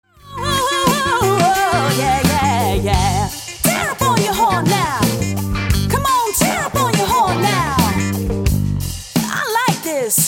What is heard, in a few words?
Horns